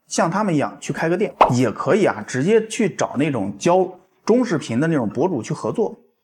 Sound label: Vocals